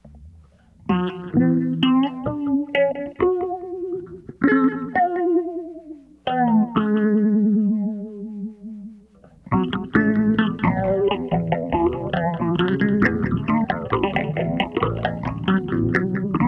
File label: Tocking Glock Springs